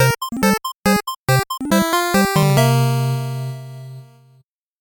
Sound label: 8bit jingle normal